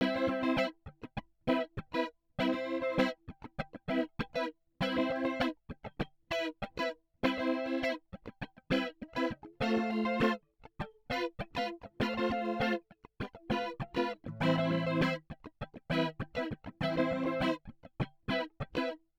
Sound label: Guitar Rythm Flanger